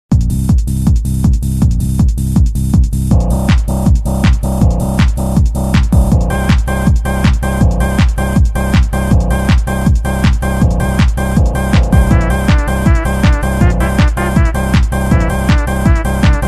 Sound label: Dance Anthem; Banjo-Tooie